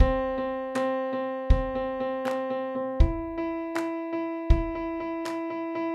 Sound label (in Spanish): trecillo